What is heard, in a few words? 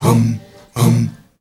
MALE HUM; Spectrasonics; Heart of Africa